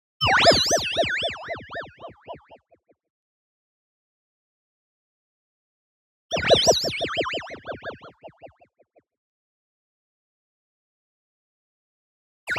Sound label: wobble, shifted wine glass resonance; boom library; Anime Essentials; DSGNMisc